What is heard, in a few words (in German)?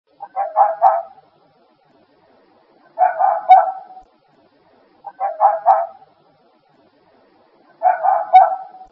ruf, fuchslaute; fuechse